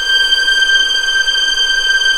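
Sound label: G6 legato piano, violins; East West; The Ultimate String Collection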